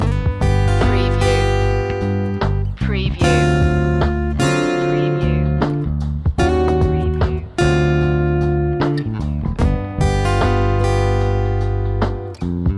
blues loop

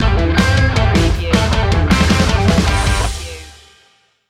play it loud stinger

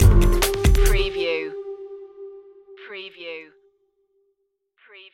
india flower festival stinger

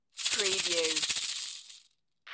Caxixi

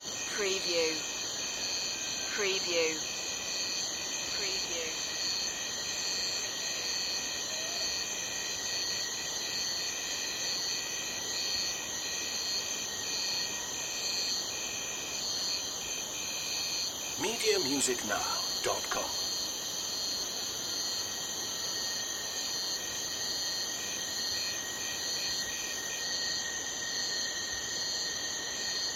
night crickets

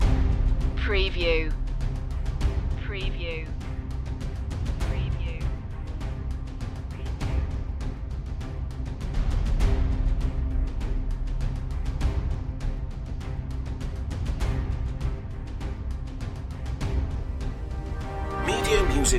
ion rise loop

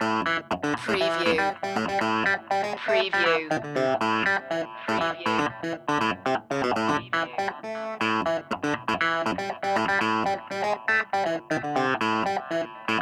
funk soul king loop

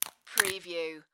Whip Cream Can Remove; Sound Effects Bible

